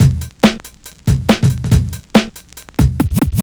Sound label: Breakbeat 140 BPM; Vengeance Samples; VEC3 Loops 140 BPM